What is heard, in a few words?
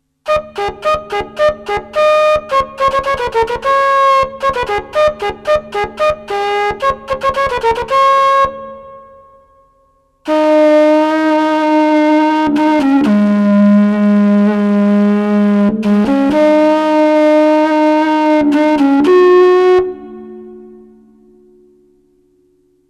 Flute